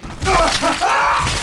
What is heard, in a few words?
die; spacemarine